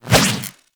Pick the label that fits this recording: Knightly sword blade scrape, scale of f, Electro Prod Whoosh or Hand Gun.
Electro Prod Whoosh